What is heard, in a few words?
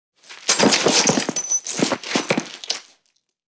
body falls into deb; fights bodyfalls; Asian Show Sound Effects